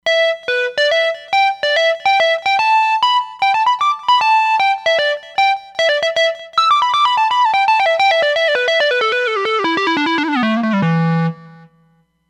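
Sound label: Square Lead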